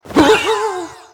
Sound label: Character Ghost Hit; Angry Birds Epic